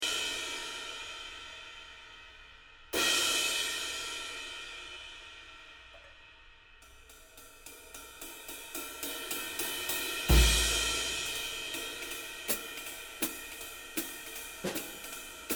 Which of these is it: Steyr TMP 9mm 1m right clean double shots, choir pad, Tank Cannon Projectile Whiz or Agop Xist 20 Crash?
Agop Xist 20 Crash